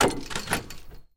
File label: rocket reload start, tanks; War Thunder